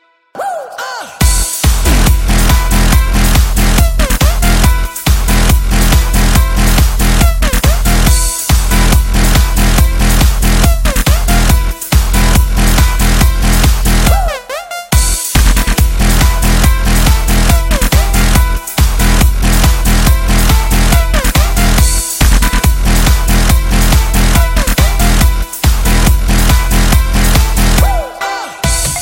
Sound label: Electro dubstep